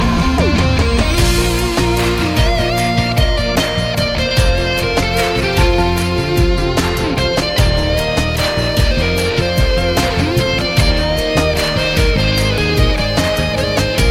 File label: love guitar